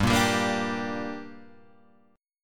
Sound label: G Minor G down Guitar Open G, chord